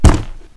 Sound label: fall small, damage; LumiCu 2.0; minecraft